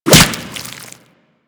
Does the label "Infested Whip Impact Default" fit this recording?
Yes